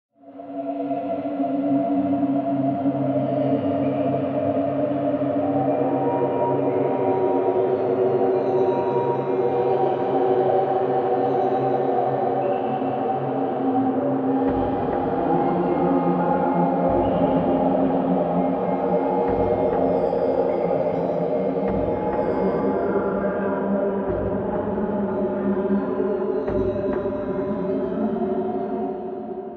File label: SWORD; ICO